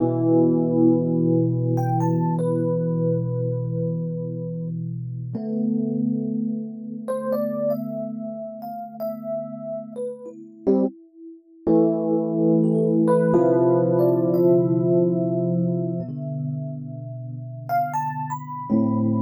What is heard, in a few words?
Em90 PIANO dry